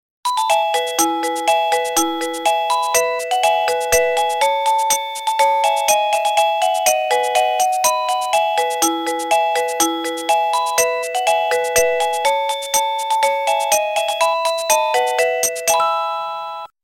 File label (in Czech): melodie